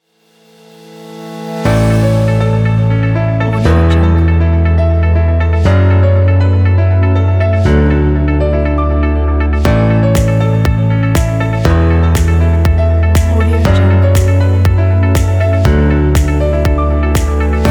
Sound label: elegant modern